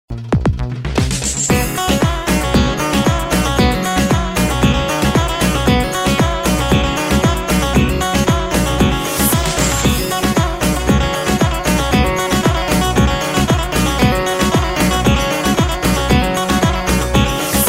Turkish Saz Kolbasti; zil sesi

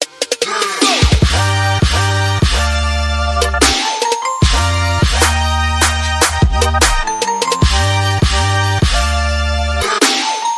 Alarm

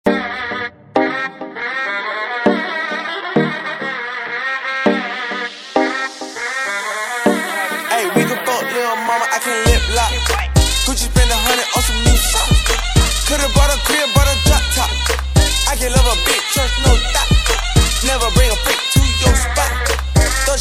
Oriental zurna

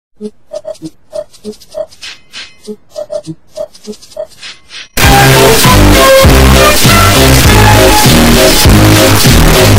BASS BOOSTED; Thomas the train

